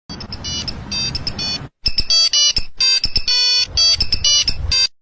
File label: nokia ring tone sound